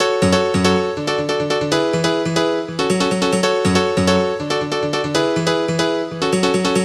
Synth Euro Piano, 128 BPM; Rap; Vengeance Samples; Vocal-Hook Kits; Song Kit

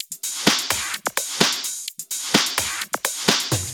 Houseloops 128BPM; Vengeance Samples

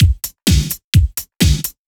Housebeats 128BPM; Vengeance Samples

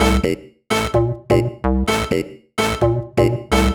128BPM Melody; Vengeance Samples; Cool Kit

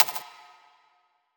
hat; Dubstep Sample Pack

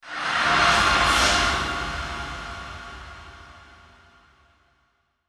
VFX Atmos

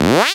Synth Shots; Vengeance Samples

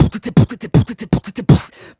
Beatbox Loops, Human Beatbox

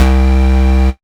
Bass C, Offbeat Bass

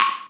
Minimal Perc; Minimal Percussion

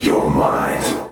Vocal Phrases; Vengeance Samples; Vocals

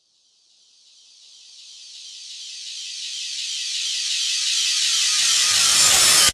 FX Uplifter

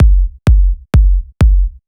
Kick, 128 BPM; Vengeance Samples; VTDS2 Song Kits; Rap To The Top

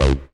303 Acid C; Vengeance Samples

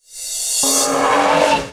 Short Fills & Reverse; Vengeance Samples

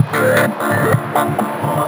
128BPM Melody; Vengeance Samples; VFH1 128BPM Basique Ale Kit

